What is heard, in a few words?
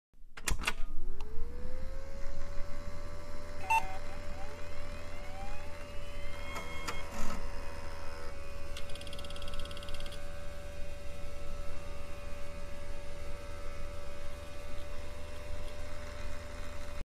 boot